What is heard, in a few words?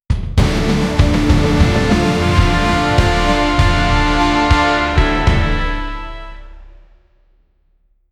fantasy videogame epic